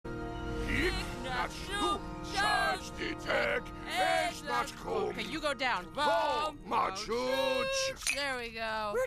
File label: singing; klingon database; General Korin